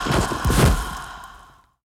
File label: Pegasus Death